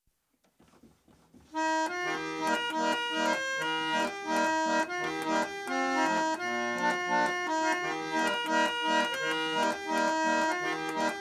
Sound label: Barrel Organ - Playing; BGM; Crossbow Warrior The Legend of William Tell